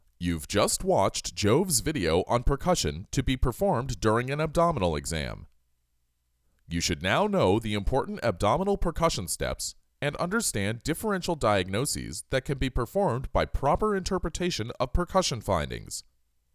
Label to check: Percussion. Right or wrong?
Right